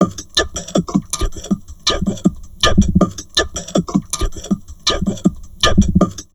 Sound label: TALK 160, Drum Loops Crazy Processed; USB Soundscan vol.07; AKAI